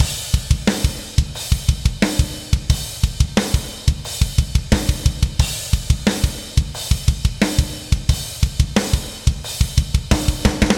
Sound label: Drums and Jingles Battle DRUMS; Metal Tales Fury of the Guitar Gods